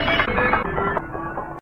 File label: Sm Glass